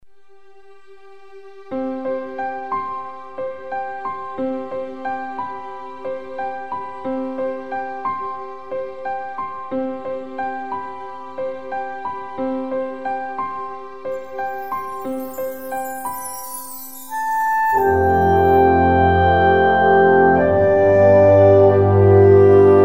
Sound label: background pads